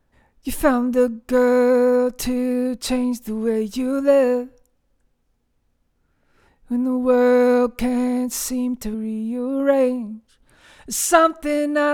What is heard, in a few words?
Vocal